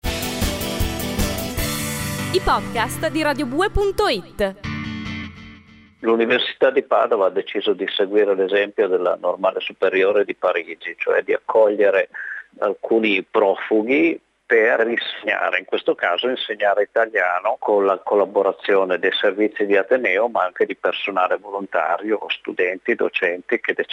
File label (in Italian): jingle; allievi profughi